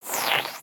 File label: Frog Hat Slurp; creatures organic; Ribbit Frog